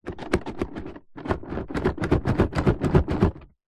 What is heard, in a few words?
leisure board game shake box; telstrations